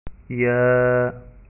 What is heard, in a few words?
yaaa